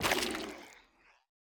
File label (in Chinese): 幽匿破坏声